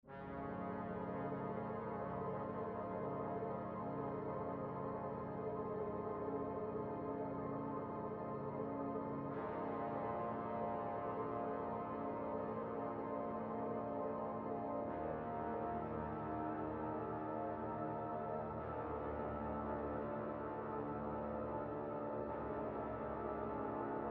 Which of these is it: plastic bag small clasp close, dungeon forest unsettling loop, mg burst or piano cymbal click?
dungeon forest unsettling loop